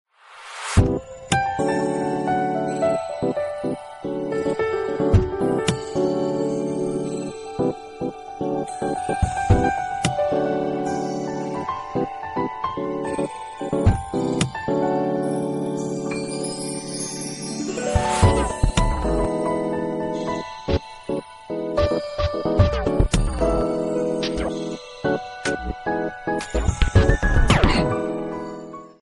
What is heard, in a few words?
s4 ringtone; break of day